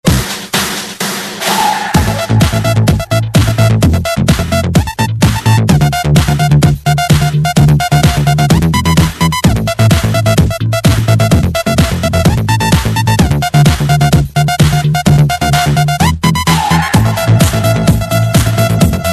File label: Tom Snare